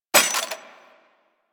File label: Burst Rifle Mech Secondary; Weapons; Grineer